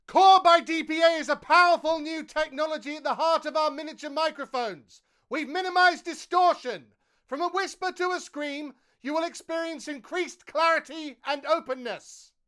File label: SHOUT